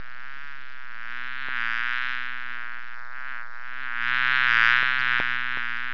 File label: Bee buzzing